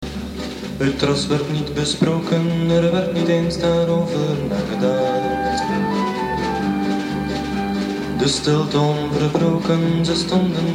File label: Ballade, banjo orkest